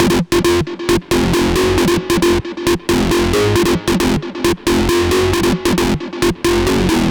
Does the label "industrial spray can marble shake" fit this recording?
No